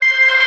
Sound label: tonal one shot C; Pop; Slate; PASADENA Pop Sample Pack; Tonal One Shots